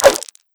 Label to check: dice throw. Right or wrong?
Right